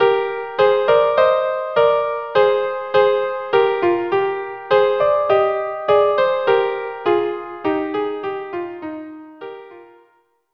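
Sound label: violin duo; heldenmusik La Tranquillite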